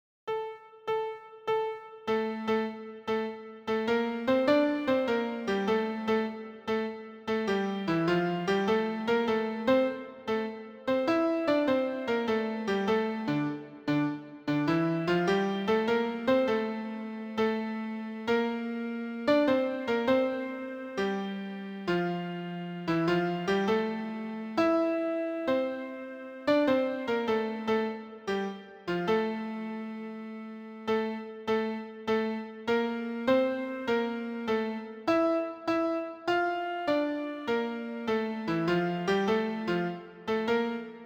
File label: Jazz Study trombone slow